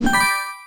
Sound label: match